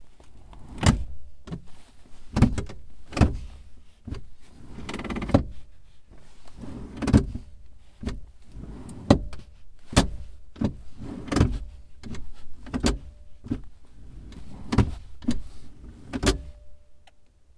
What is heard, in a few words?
Adjusting Car Seat